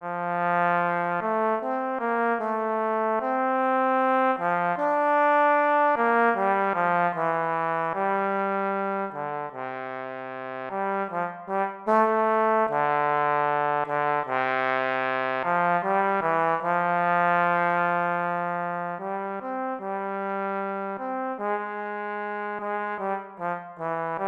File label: Trombone